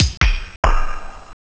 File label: Bass instrument; sonix; chipmusic